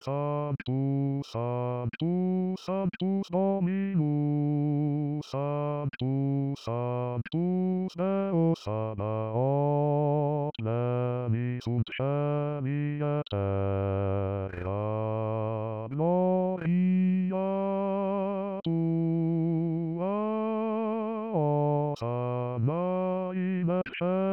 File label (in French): basse; missa pro europa